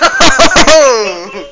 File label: hoh hooo, voices; The Epic Collection 3; Epic Marketing; muzak samples